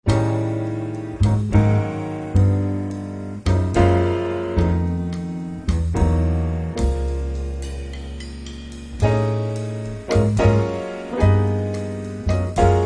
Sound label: samba jazz trio